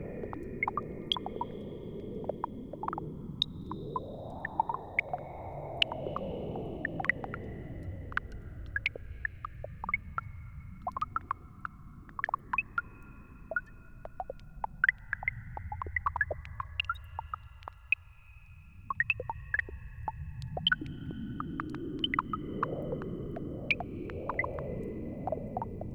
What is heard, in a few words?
Dusty Caverns